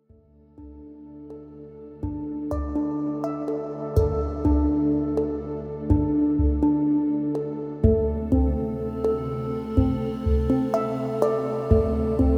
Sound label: Hang lost in Meditation